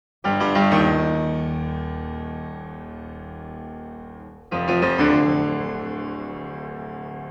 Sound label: Symphony No.5 Allegro con brio; Glenn Gould